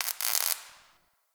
Destruction Electricity Sparks; Ambience; Warframe; Corpus Railjack

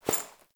Body Move

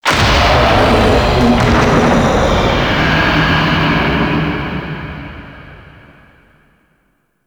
Infested Hive Destroy Rumble; Gameplay; Warframe; Corpus Infested

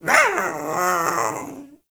Pet Speak; Warframe; Catbrow; PetShip

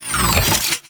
Un Equip, Shotgun; Crp Arca Plasmor

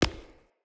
Acorn Impact

